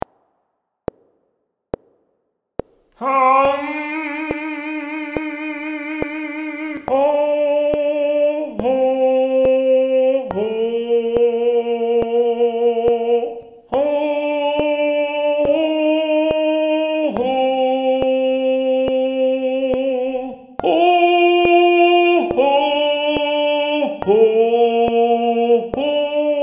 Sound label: Tenor 1, lydspor